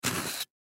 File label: Snow Knife Rusty Blade Scrape Snow; Weather; Scrape Sound Effects; Part of Scrapes; Blastwave